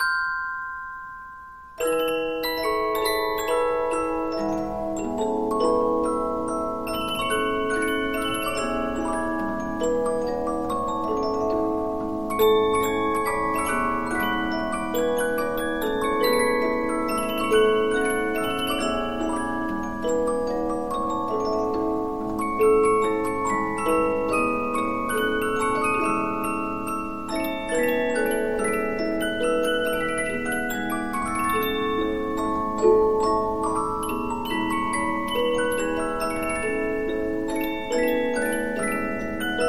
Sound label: Polyphon, 15inch disc musicbox